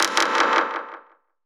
Tauk, One Shots Hit; Sonic Foundry (Sony Creative Software) - Future Retro Dance Excursions

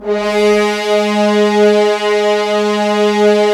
Horns; Roland - LA Composer vol. 4

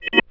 CHORD, Drum & Bass, 130 BPM; Ueberschall; Drum & Bass Resonance

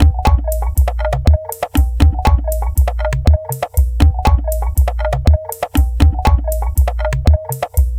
Bamboo Mbira Beat, Composite Beats; Sonic Foundry (Sony Creative Software); Myths of Technology

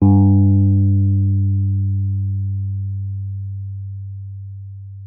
Wurlitzer G2; Xsample VOL-1; 90 s Sample CDs; WURLITZER B

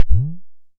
Perc - Bend Up; Tama TS-206

